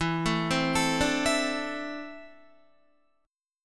E 7th Suspended 4th Sharp 5th, Guitar; E7sus4#5 arpeggiated; 11,12,12,14,14,12; JGuitar

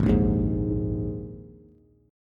Ab Suspended 2nd chord, Bass; strummed; JGuitar

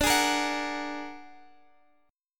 Bb Augmented 7th D

